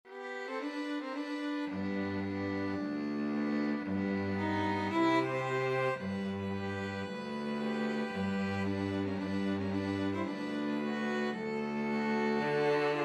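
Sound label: strings, 2 violins cello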